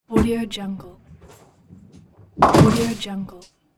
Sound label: bowling spare